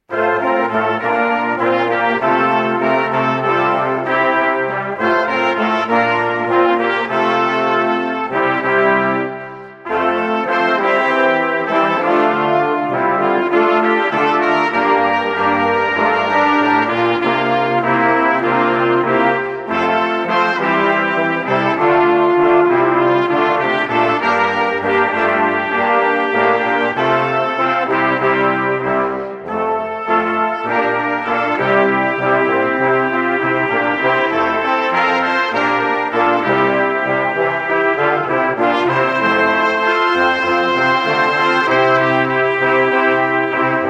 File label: Brass